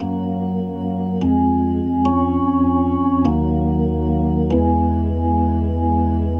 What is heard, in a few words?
keys beautiful